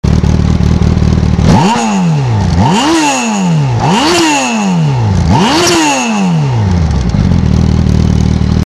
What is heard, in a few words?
suzuki bking